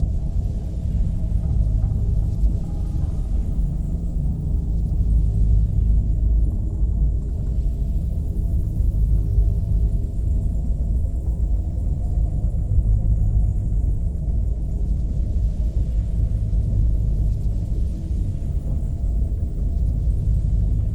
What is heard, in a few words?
megastructure hyper relay idle; Stellaris; overlord; Megastructures